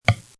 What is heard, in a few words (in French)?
tic; MOBICLIC 84; BIDULE